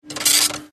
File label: printer comment